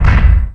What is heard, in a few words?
Collision